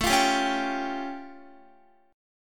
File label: A Augmented 7th, Guitar; x,12,15,12,13,14; 4E,4A,5D,5G,5C,6F; strummed